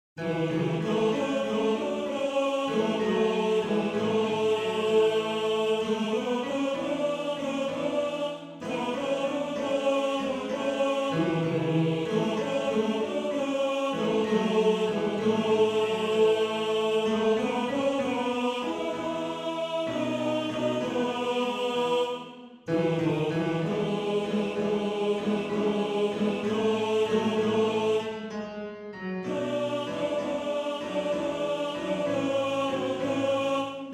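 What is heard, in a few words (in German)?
Tenor 2, Männerchor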